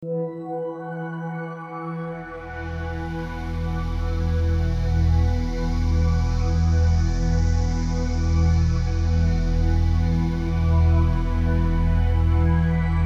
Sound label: transition